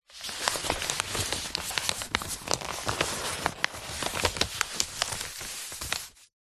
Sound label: paper x5 sheets a4 flat handling gentle rustle; foley; zapsplat